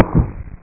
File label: tennis; breakin